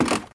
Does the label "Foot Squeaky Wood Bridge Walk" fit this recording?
Yes